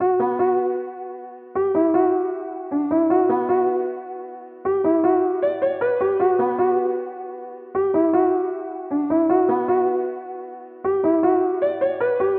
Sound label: Drill Piano